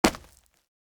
Foley Footsteps Rocks Sneaker Jump Land On Two Feet Close